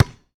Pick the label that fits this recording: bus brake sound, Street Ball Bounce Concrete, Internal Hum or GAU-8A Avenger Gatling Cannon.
Street Ball Bounce Concrete